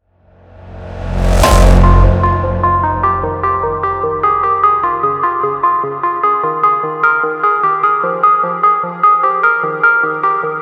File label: sound of thunder remix